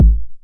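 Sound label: 909 BD tape, kick drum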